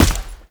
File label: Heavy Step Default, Footsteps; Enemies; Foley; Warframe; Tau Dax